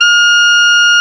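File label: Bb ff Clarinet B3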